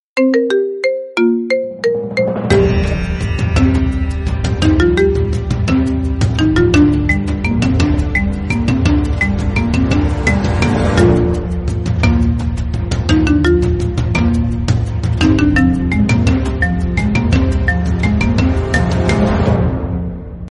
marimba; Game of Thrones; iPhone

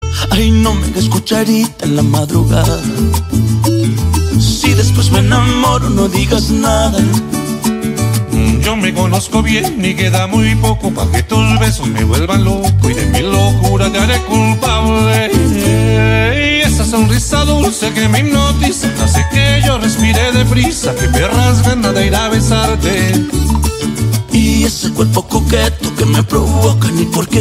remix

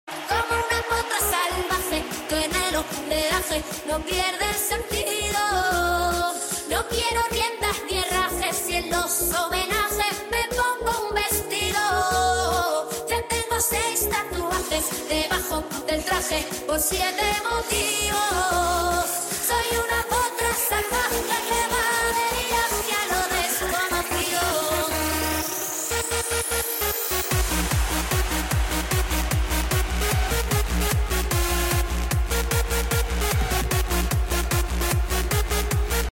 hard remix

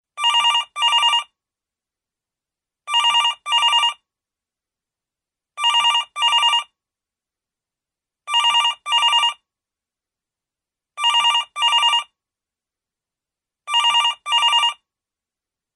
landline

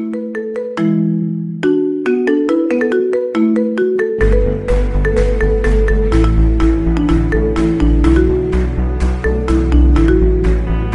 marimba remix

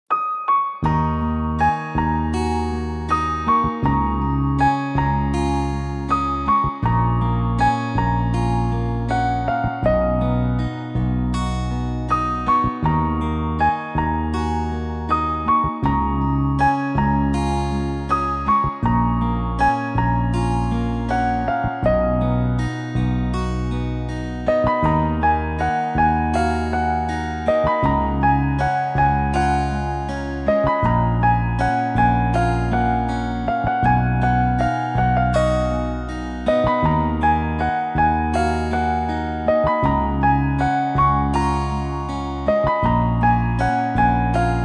sad piano

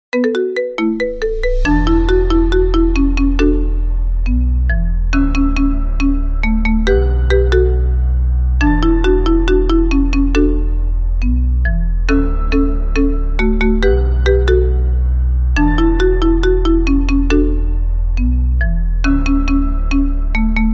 static

